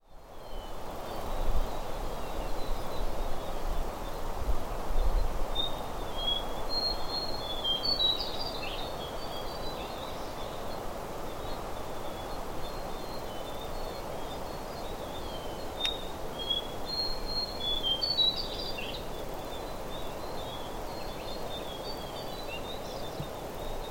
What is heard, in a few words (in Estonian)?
Ijen vulkaani mets